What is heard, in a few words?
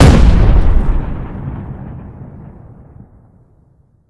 explo; deepimpact